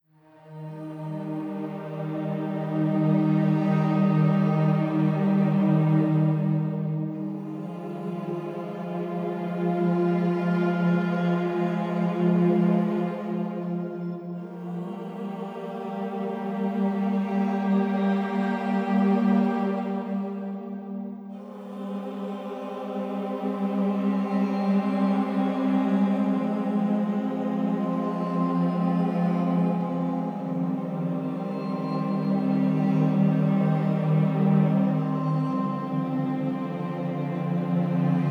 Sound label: Music for Cats